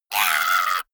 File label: monster hit high; schreie